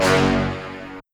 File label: soft hitwhistle; osu!